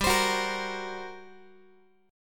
G Minor Major 7th Double Flat 5th, G, down, Guitar, 4A 4E 5A 5D 5G sharp 6C sharp; GmM7bb5; strummed